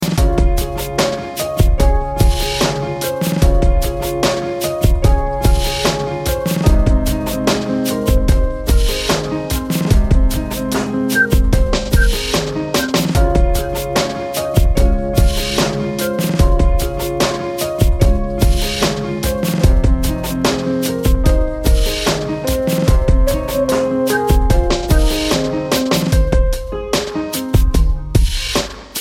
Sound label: jazz